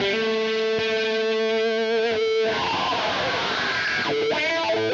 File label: Electric Guitar, Loops; Guitars